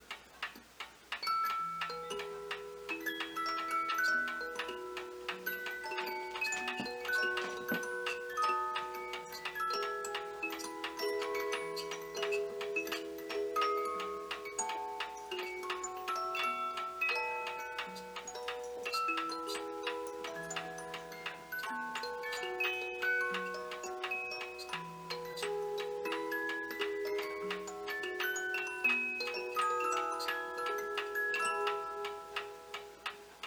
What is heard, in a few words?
musical clock; kroeber